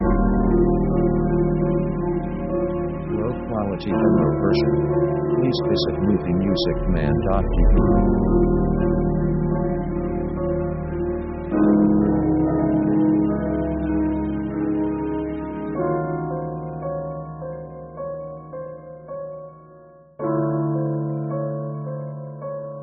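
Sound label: orchestral chromatic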